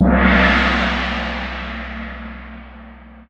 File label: Orch perc; Orch Perc and Stings; cartoon; musicradar; cartoon caper